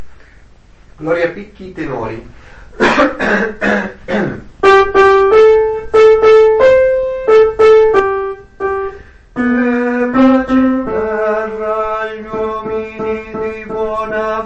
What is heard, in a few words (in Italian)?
tenori; Gloria Picchi